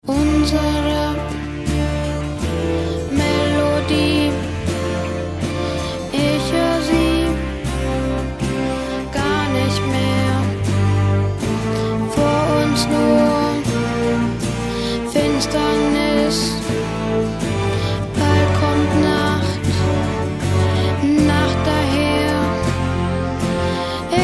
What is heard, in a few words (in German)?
canto